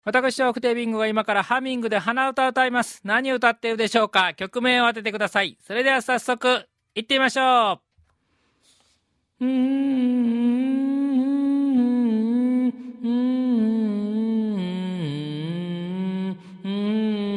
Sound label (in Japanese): hanauta